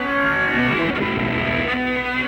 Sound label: Burn Down, Electric Guitar; Sonic Foundry (Sony Creative Software); Friendly Fire; Wrath